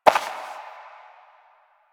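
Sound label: Step Dirt, footsteps; Warframe; Koumei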